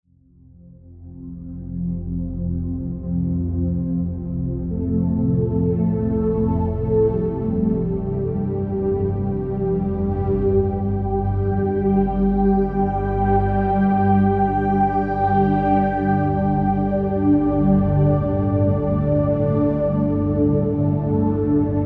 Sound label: pad